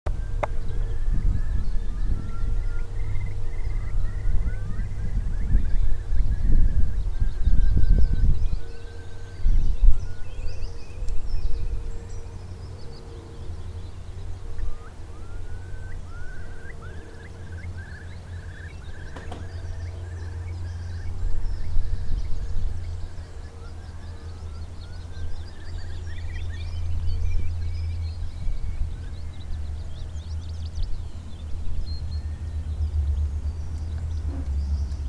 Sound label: curlew